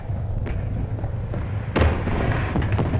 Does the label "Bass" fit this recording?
No